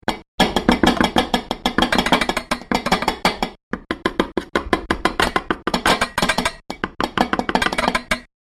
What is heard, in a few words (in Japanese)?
kakimazeru